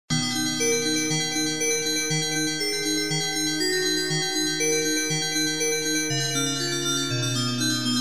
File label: Ambibell